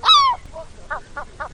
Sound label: gull; minetest; ambience